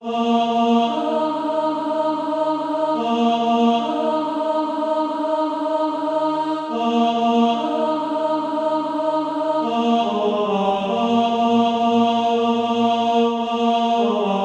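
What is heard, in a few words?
tenor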